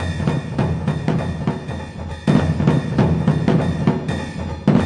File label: 100 bpm japanese hi stuff, drum loops and breaks; 091 to 100 bpm